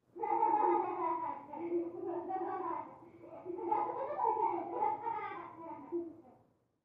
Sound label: Laughing; Several Children, From Next Room; laughs